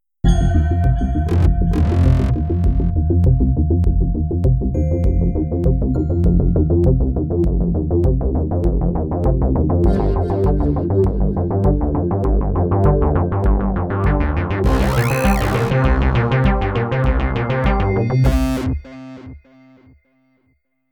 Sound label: Escape jingle; garageband